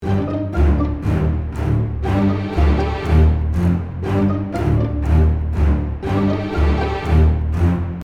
String ensemble loopable threatening jingle

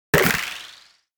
Casino slot machine scatter symbol

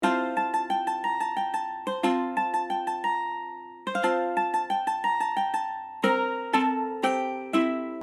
Medieval bard mandolin festive melody loop

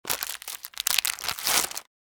Small plastic packet food rustling

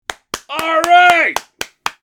Elderly man happy cheers and applause; misc human

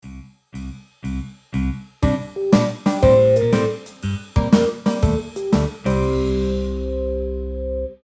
Plot transition theme break sitcom

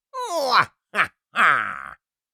Mwahaha laugh little fantasy creature; fantasy friends

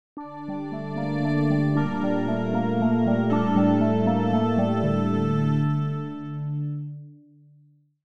Game over you lose futuristic sci fi

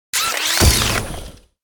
Robot tactical unit footsteps single step; robotic

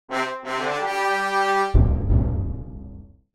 Mobile game epic victory level complete

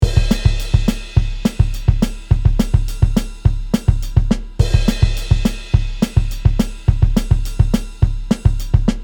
Drum loop

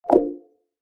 Bar chart fade out animation infographics

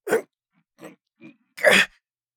Adult man grunt of effort noise

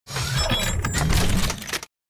Sci-fi weapon reload rifle reloading

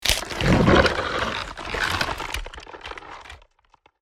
Werewolf claws extending transformation

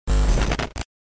Error glitch

